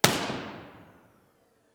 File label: IR On-axis, Oklahoma City Civic Center; Room Survey; Location: Oklahoma City, OK